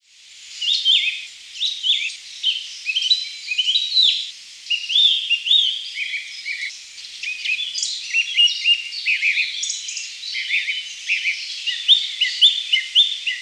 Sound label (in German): singdrossel gesang